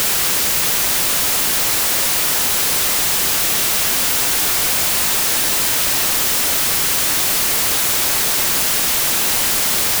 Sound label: white Noise